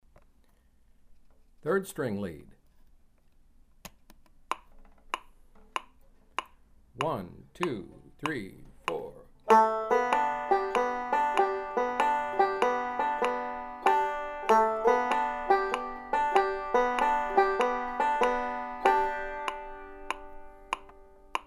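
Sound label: 3rd string lead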